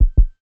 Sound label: heartbeats; netent